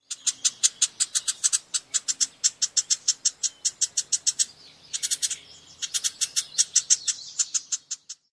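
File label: House Wren, excited chit calls; NY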